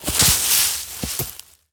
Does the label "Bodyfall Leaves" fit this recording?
Yes